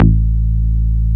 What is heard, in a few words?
PRESSURE A1, BASSES